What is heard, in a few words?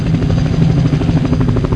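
helicopter; Military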